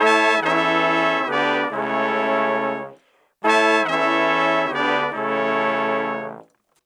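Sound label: 140 A Brass Ensemble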